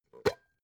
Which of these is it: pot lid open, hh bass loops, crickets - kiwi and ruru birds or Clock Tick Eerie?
pot lid open